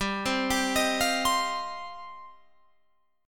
G 7th Suspended 4th Sharp 5th, Guitar Open F; Listen to G7sus4#5 arpeggiated; G7sus4#5 Chord

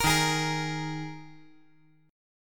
Eb Suspended 4th Eb 5G 4C 5E 5A; Ebsus4 strummed; JGuitar

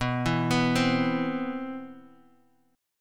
C Major 7th, B, guitar chord

